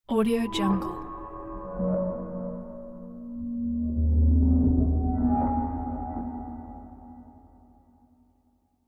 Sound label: Sci-Fi Sound Effect